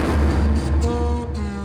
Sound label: Mus Tsunami 145 Light; From Dust; ps3; xbox 360